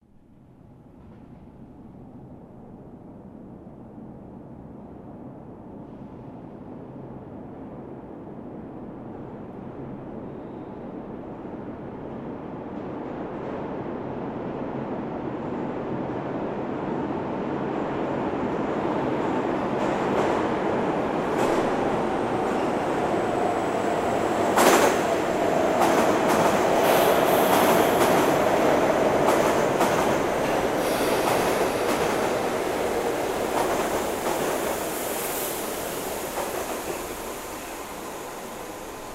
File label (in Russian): metro poezd priezzhaet na stanciyu